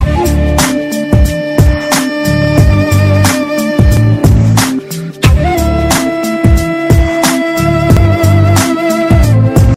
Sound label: Romance